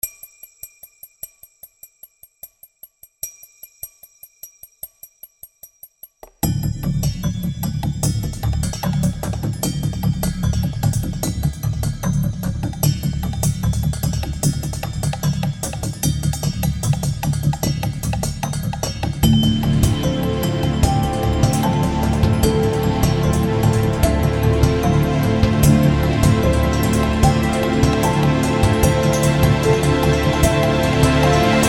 Motor Rhythms Rust; Soundiron

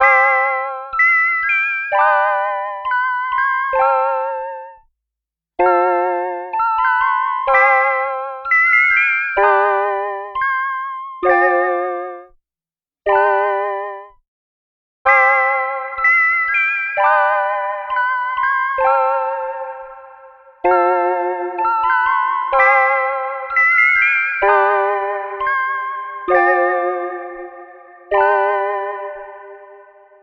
Dainty Synth

